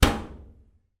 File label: household washer top loader lid close